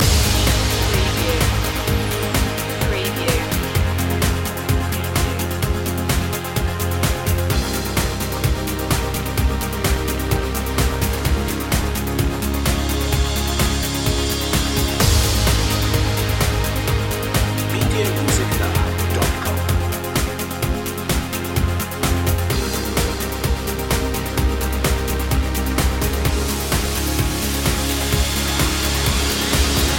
pass me by loop

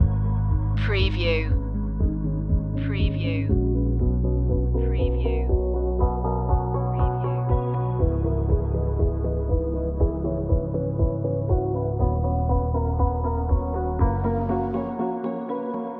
aerial messages loop

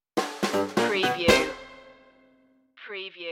clown-o-rama stinger

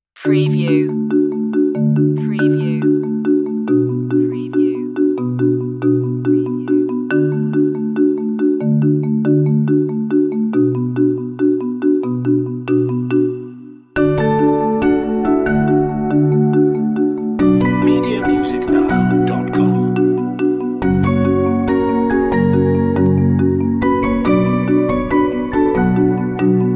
hourglass mallets and piano only

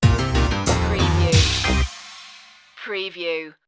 urban cossacks sting

